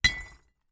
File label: impact glass bottle drop onto smashed glass debris